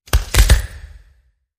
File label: Bones Breaking Hit